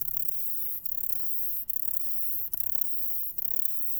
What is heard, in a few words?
song, katydids, neduba inversa